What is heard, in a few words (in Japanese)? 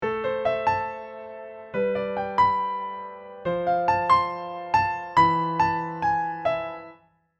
ベースとフレーズ反行イントロ